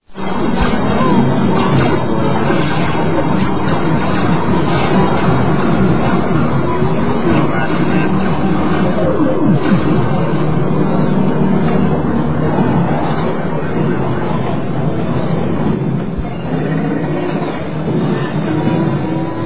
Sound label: Mass noise; ambient arcade background noise; MAME (Multiple Arcade Machine Emulator)